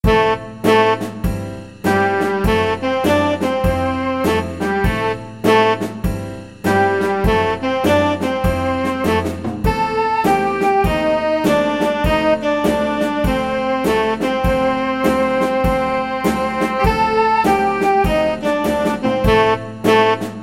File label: jazz ensemble